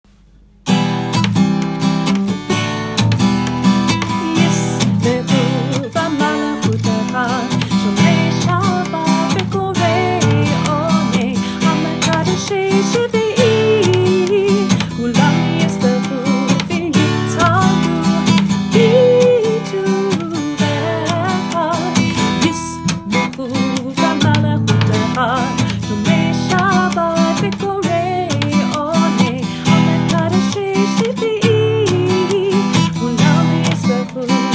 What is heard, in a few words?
Yismchu Folk